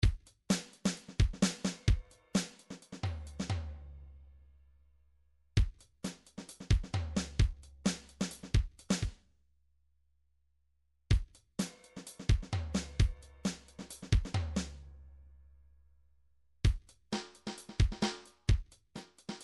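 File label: drums pattern, midi; Paul Kodish Dangerous Drums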